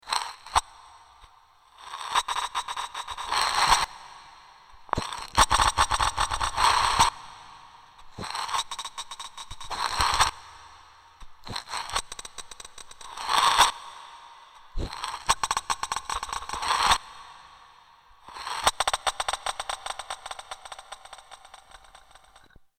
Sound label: reverb; soma the pipe